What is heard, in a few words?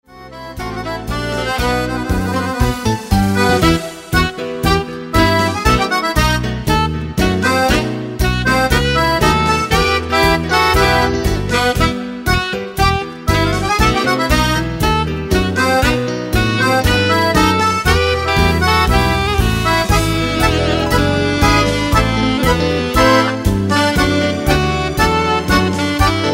tango